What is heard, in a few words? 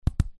hit click; new-years